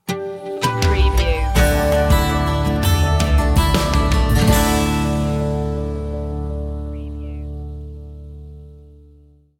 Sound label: water gardens sting; acoustic country folk